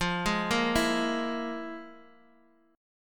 F Minor Major 7th Double Flat 5th, F, Guitar, Open D; x,x,3,2,1,2